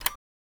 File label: big switch